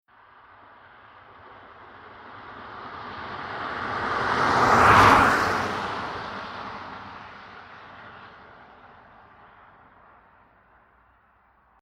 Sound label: Transport Car Saloon Passby Single 60MPH Wet Asphault